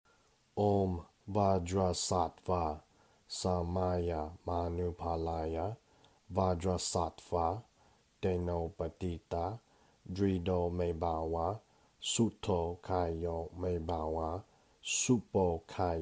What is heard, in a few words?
100 Syllable Vajrasattva Sanskrit Mantra